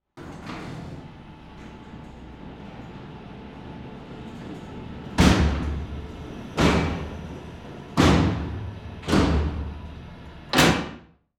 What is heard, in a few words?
Emergency Cell Doors Close Electronically; emergency effects; AdobeCC